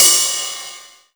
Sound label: Ride Long TR909